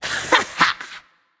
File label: yay; smite; persephone